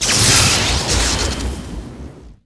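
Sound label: skill light bolt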